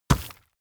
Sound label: horse step dirt